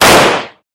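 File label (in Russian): короткий выстрел по мишени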